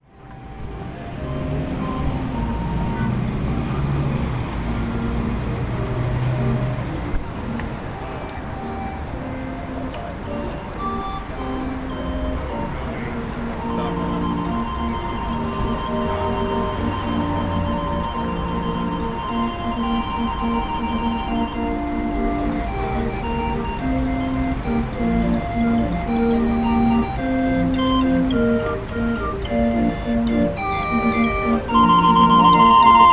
organ grinder playing traditional songs, jungfernstieg hamburg